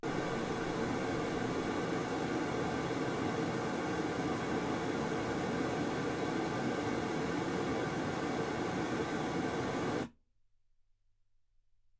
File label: pink noise 48kHz